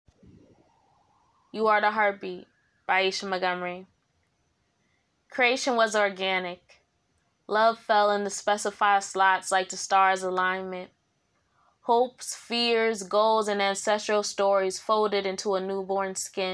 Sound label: heartbeat